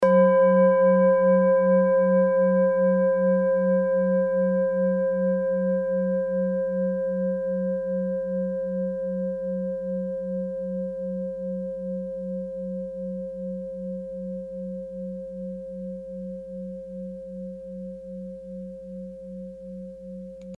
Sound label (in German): klangschale orissa